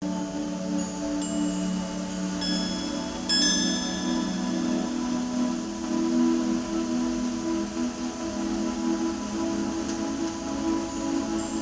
relaxation with crystal rain

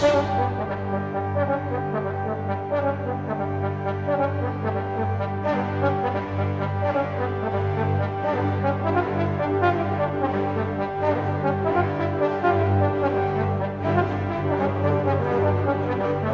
concerto for trombone and orchestra

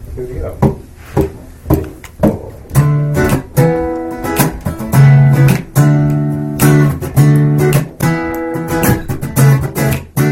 acoustic mix

